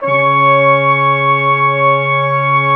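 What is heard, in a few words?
brass, Quintet; Roland L-CD702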